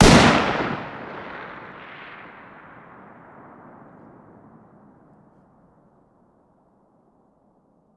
fire dist 308 mg ext; gmod; arccw uc commons attachments

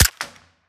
fire, arccw sound

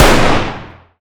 fire dist 300blk rif int

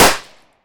confetti; gmod; arccw uc commons attachments

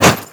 fireaxe heavy; tfa starwars melee weapons pack

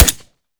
fire sup; gmod; arccw uc commons attachments

